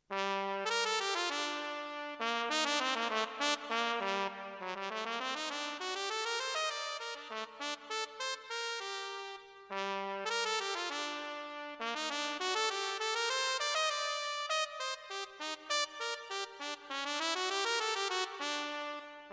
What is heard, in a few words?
Trumpet